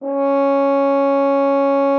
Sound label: FR HORN C#4; Xsample VOL-3